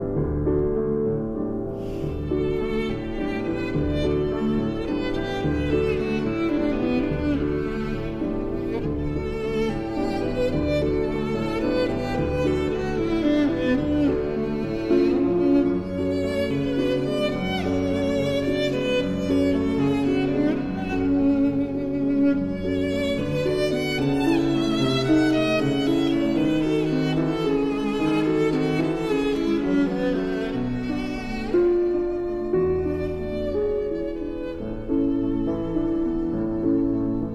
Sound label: Violin and Piano in D